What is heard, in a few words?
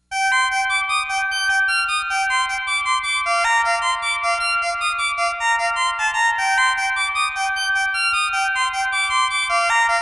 high pulse; Thomas Henry; SN-Voice